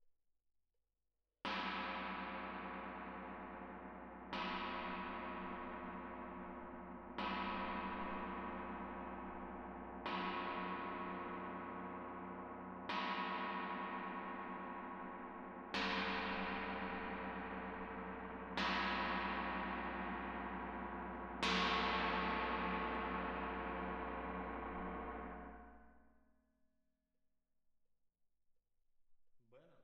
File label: Tam-Tam Main